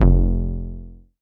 bass F1, ARP Odyssey

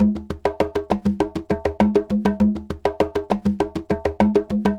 Conga Groove, Latin Percussion

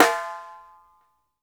PING, Drumworks; Sampleheads; New York City Drumworks VOL-1

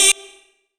Vox Hit G3; Zero-G; Club Techno

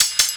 LOOP L, Drum & Bass 160 BPM; Ueberschall; Drum & Bass Flash Trackz

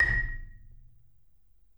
Marimba A#5; Sampleheads - Dave Samuels Marimba & Vibes

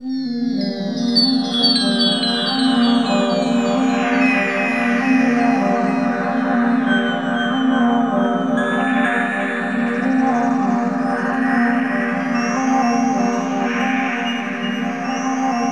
Synth Atmos; Best Service ProSamples vol.36 - Chillout

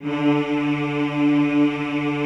D2, Classical Choir; Best Service; Extended Classical Choir

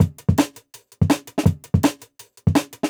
Jungle Breaks

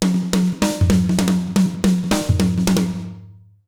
160 B BEAT, Jazz Latin Drumloops

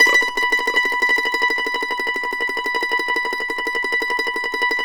MANDO TREM B5, Whole Lotta Country; Sampleheads; Whole Lotta Country 1 & 2

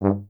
tuba; Miroslav Vitous - Solo Instruments VOL-2

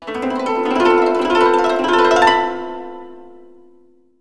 koto gliss

